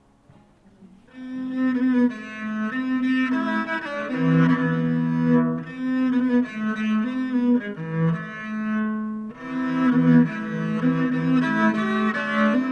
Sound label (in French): Interlude viole de gambe